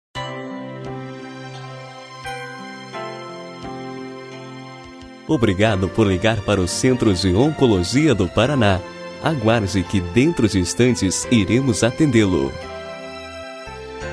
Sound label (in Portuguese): espera telefonica